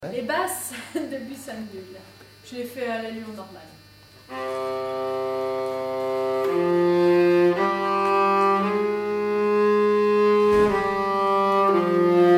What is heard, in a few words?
nyckelharpa; byssan